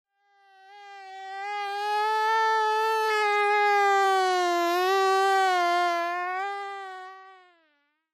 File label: Mosquito-Real